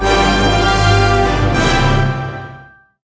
medium Win; excalibur

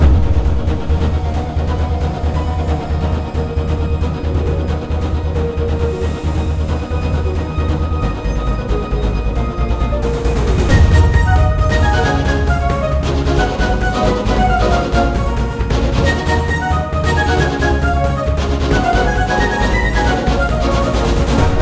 free spin ambience tritonus up; goldentavern

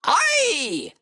oyy; goldentavern

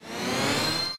pre wild reels stop; spinsane

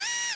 Robot Dance Snd; eldorado

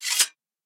quick stop; warlords

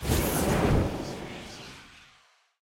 lamp burst; halloweenjack

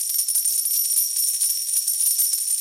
lion coins; godsoffortune

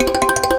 count Up; gorillakingdom

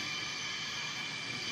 Car Ride; knight rider

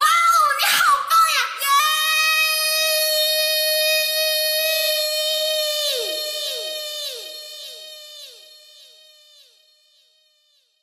Bigwin Scream; koi princess

